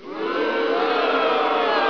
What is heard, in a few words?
lose; assault